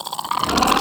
Pistol Reload; Warframe; Sapient

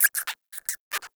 Rodent Vocal Captured Idle; Warframe; Hunting Critters; Characters

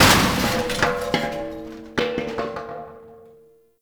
Destructible Metal Barrel; Ambience; Gameplay; Warframe; Nineteen Ninety Nine

